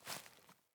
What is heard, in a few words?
Grunt Boot Walk Veg, Footsteps, Boots; Enemy; Sinecure; Melee Grunt